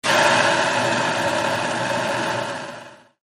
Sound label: Spray; kickbuttowski; mellowbrook mayhem; cheetah